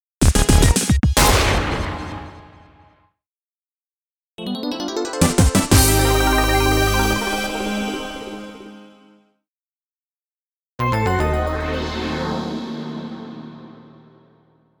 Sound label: Mission Start Success Failure; bullet girls 1 2 soundtrack